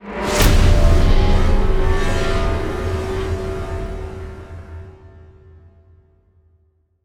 Stinger Modulate; extinction deluxe edition; ps4 windows xbox one

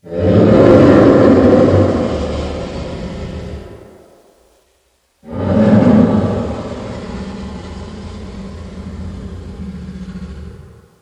Woods - Bear Sounds

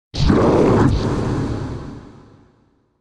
Satan Blast

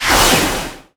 Ingame Score Minus

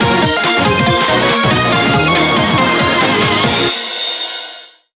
Bgm Reach

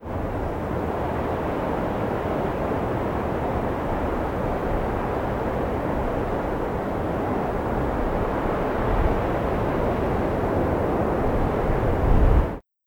Ayers Wind

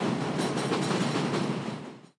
Train Passing Idle; subway surfers city sound effects

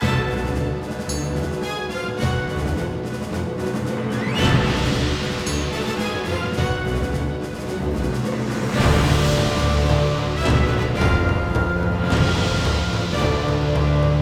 Boss combat; The Hobbit; PS2

